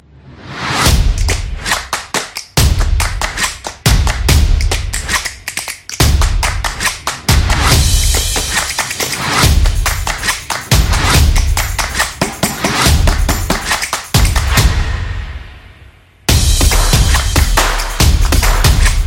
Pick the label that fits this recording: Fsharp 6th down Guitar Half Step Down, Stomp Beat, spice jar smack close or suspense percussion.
Stomp Beat